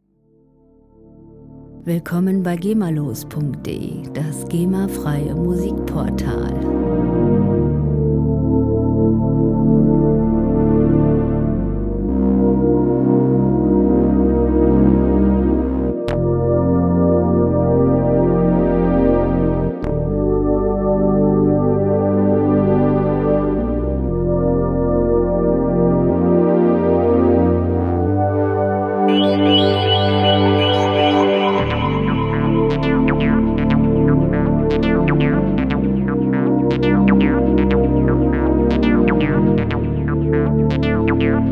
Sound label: toxic jazz